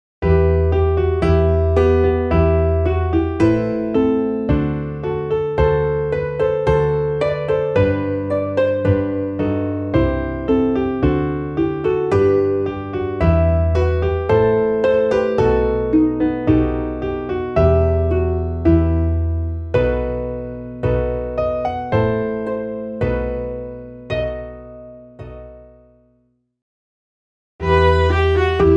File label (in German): midi klavier